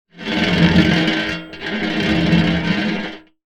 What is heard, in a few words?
Baking Tray, contact mic, Metalics